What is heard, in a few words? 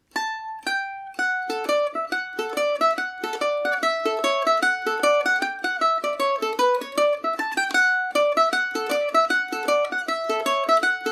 fairy reel dmaj revisited, mandolin